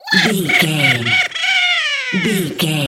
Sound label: goblin fairy laugh angry